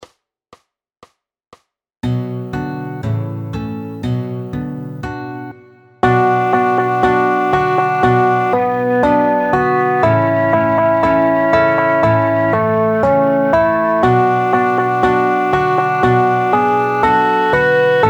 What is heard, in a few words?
What shall we do 120bpm, gitarre